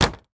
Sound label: household bin large wheelie bin lid close; sound effects one